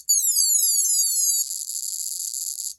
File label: hidden bomb sound, robot toy